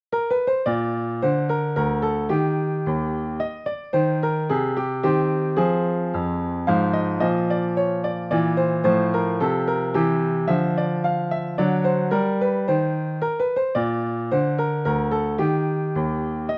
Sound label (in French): menuet; octobre